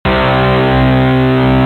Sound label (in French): Guitare; bruitage; Instruments muzik